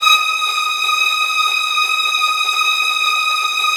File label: STR TREMOLO, Strings